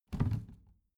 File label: plastic trash can open heavy